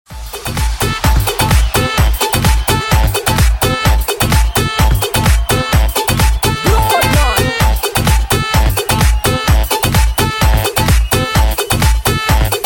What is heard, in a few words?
saxo club